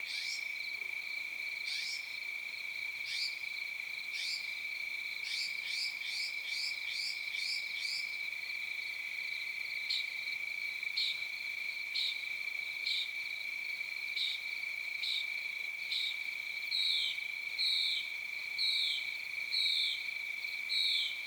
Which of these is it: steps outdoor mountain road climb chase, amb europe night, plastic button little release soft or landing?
amb europe night